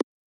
click

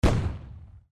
explosion

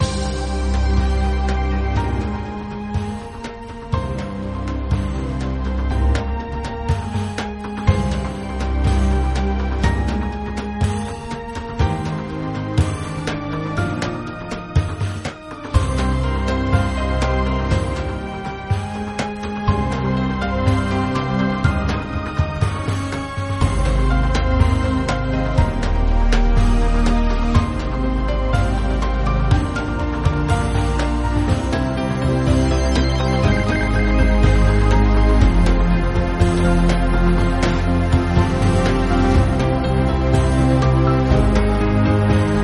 desert, musique

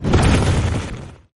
jetpack

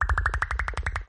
plop

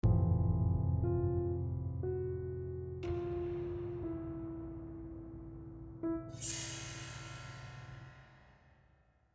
piano

